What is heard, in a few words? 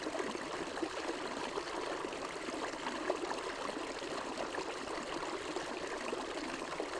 stream near small waterfall